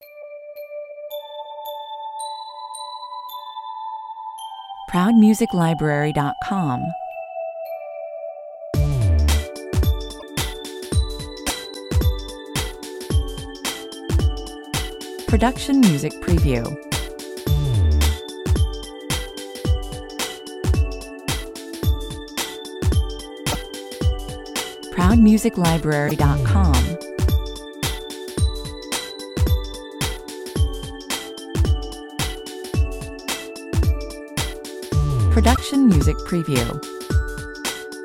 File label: fast groove